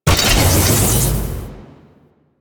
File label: Timer Start; Kahl Brother; Warframe; Veilbreaker; Devourer Kahl